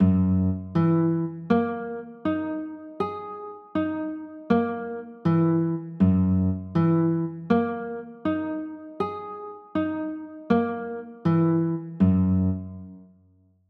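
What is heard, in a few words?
G flat 6 chord, guitar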